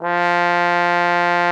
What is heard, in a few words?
F3, TROMBONE; Xsample VOL-3